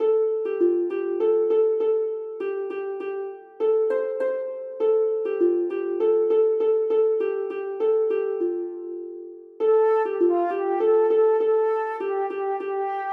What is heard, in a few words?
Mary Had a Little Lamb (Harp & Flute)